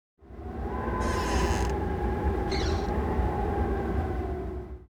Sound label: wind cold howling